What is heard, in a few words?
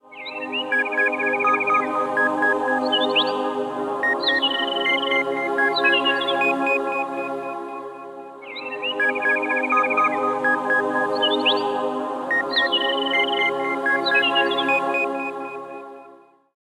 Dawn Chorus, alarms; LG Optimus G F180K